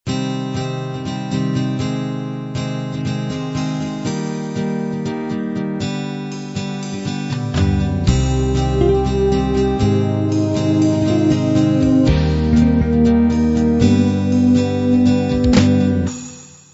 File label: classic beat intro